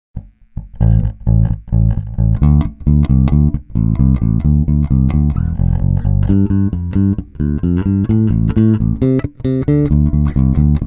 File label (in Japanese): Bartoliniパッシブ・指弾き, ベース; TAURAMベース